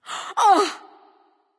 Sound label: surprised; smite; aphrodite